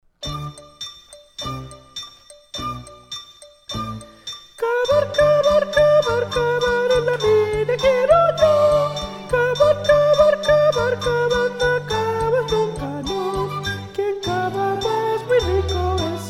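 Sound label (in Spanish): cavar cavar soprano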